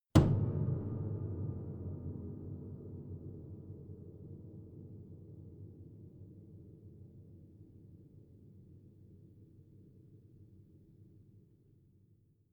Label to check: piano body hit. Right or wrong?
Right